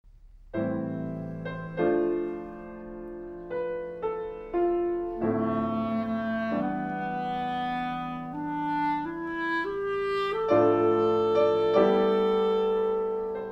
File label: Sonata for Clarinet and Piano; II—Adagio